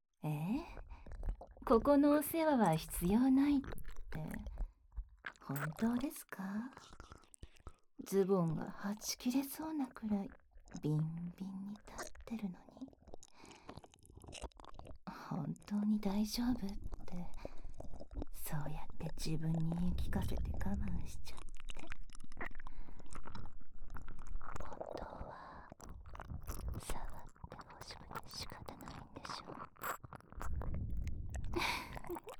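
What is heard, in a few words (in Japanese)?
耳舐めされながら手コキ・キス・フェラ・乳首舐め・素股・騎乗位される音声; ベテランペットシッター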